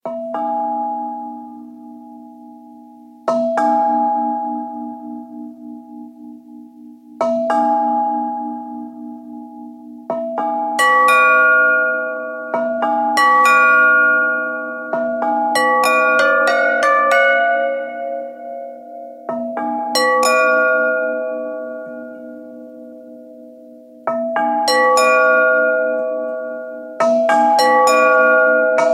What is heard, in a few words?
pots and pans